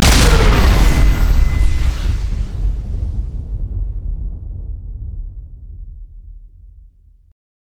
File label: vi r land